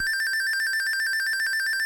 slowdown; superbroswar

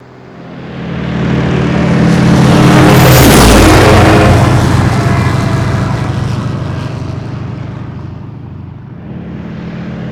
stuka, vehicle; mohaa

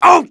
damage; mohaa; dialogue

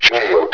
shield; hurrican

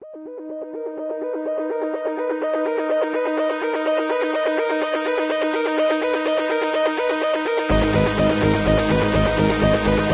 low-fi, victory music; cdogs

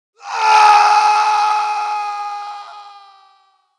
death, dialogue, Allies; mohaa; Mission 1

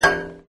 metal ting; singe; LBH HD

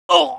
damage, pain; mohaa; dialogue

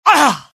death, pain; mohaa; dialogue

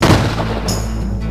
death; singe

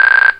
mine trigger; cdogs

